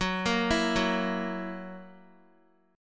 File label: Gb 7th Gb down Guitar, chord